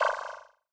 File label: Bet down; Thunder Fighter